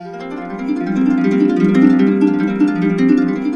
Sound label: Complete Harps, F; USB Soundscan vol.62; AKAI